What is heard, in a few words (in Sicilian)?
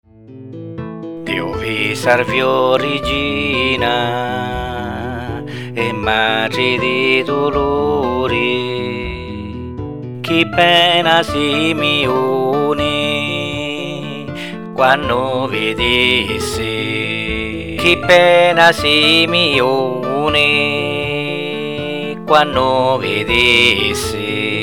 salvi rigina adduluata voce strum; canzoni religiose